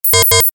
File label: Computer Beep, Short; Multimedia; Stuart Duffield